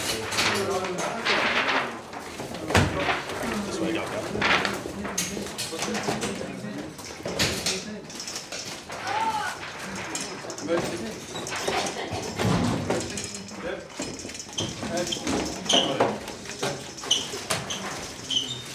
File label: Hospital Sounds